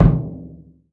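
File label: bass drum HRD, 30S JAZZ; Northstar - New Gold VOL-1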